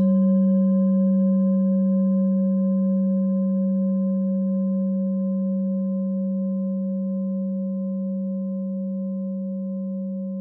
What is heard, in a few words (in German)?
klangschale orissa